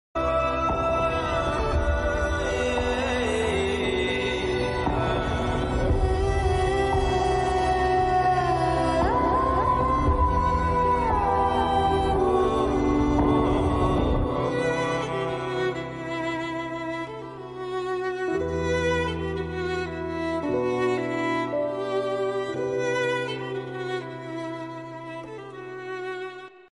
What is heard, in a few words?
ringtone instrumental bgm ringtone dj ringtone